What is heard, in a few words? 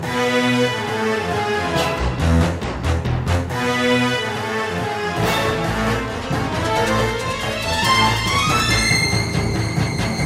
Violon